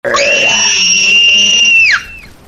screaming rage

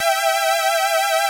charging shoot; deltarune

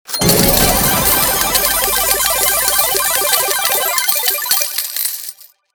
kaching win

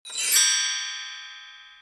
chime shot

